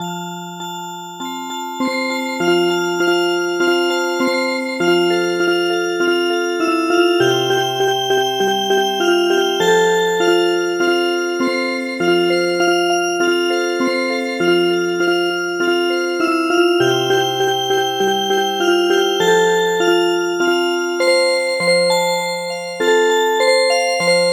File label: Music Box, midi